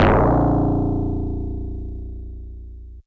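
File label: Easy Clav DX100 A#0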